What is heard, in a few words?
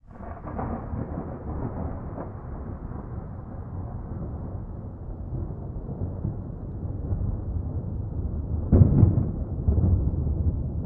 nature thunder clap rumble no rain